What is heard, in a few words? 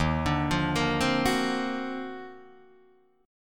D sharp Minor Major 13th, Guitar, DADGAD; arpeggiated